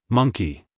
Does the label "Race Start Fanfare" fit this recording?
No